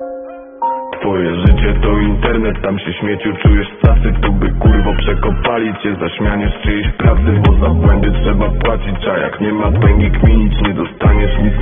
Alarm

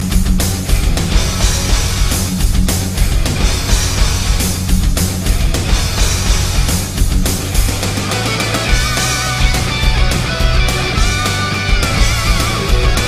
rock ringtone

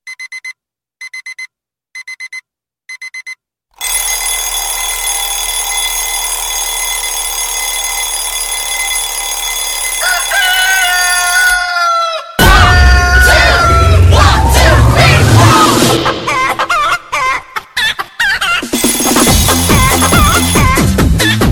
alarm clock

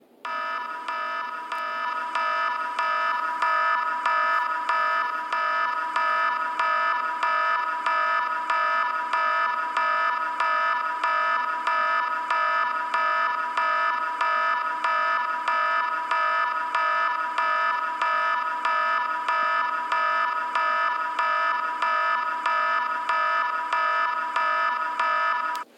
Alarm Sound Effect

